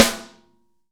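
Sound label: snare ambient; Best Service; Real Mega Drums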